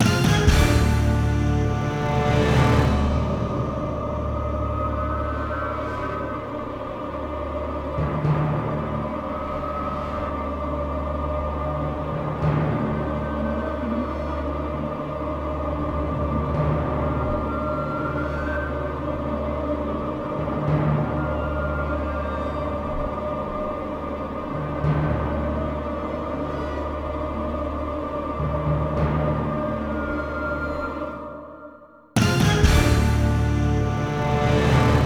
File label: Fanfares, mus fatality start; MK9